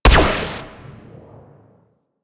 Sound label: Amp Chassis Fire, Amplifiers; Operators; Corp Amp Set One